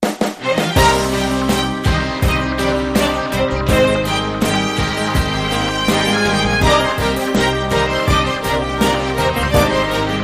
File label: Big String Beat